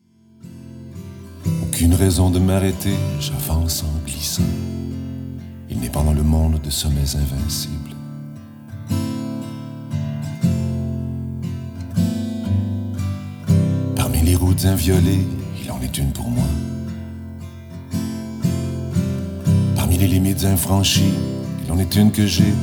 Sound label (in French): guitar voix